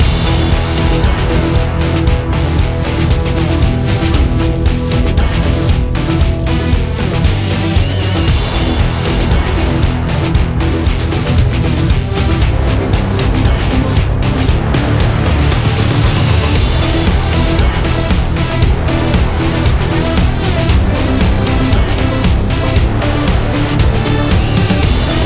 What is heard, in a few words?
Battle Theme; Canto II Battle Theme C1